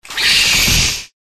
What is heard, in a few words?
Scolopendra